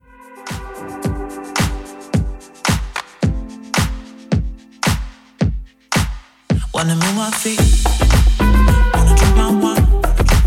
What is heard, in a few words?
Cocktail Lounge